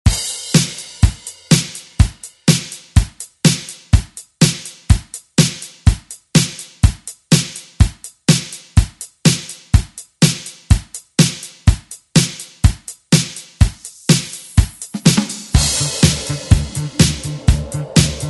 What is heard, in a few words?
70s Re Drum; DJ Mhark